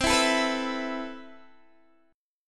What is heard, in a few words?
E Suspended 4th C; Esus/C strummed